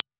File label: EV RE20 HPF, impulse